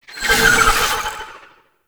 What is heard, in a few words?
Shield Drone Projectile; Enemies; Abilities; Warframe; Tau; Dax Medic